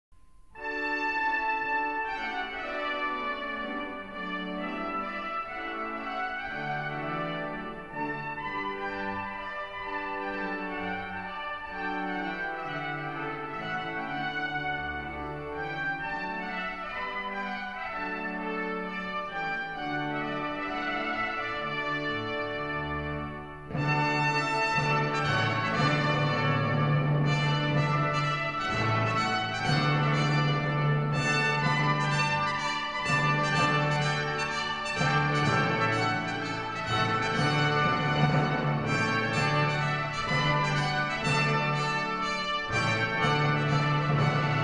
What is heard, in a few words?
Three Trumpets Trumpet Voluntary